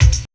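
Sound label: ROCK 132 tambourine, Big Beat Drumloops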